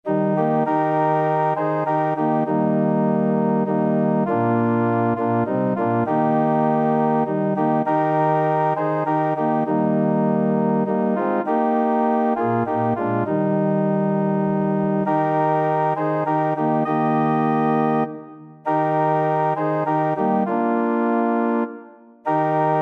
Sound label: organ